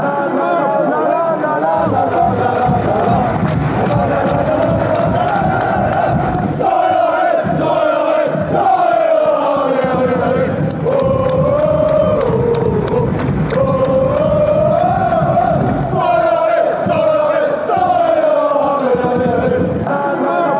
come on ole, chants; malaga

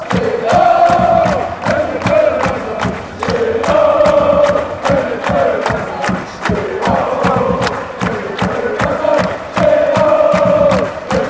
chants; stand up

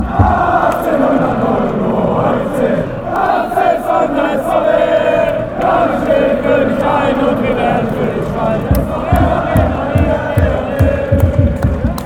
achtzehnhuntertneununneuntzig, fan chants

